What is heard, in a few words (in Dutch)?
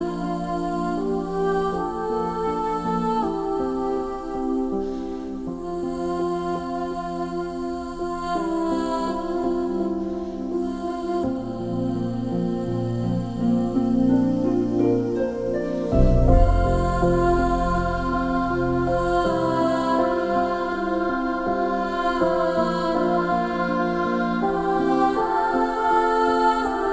instrumentaal